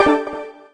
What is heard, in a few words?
menu back; edge extended; android gamerip 2012